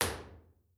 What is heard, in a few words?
Real Places Fox Caves Wide L; Impulse Responses; Ableton Live 12 Lite; Hybrid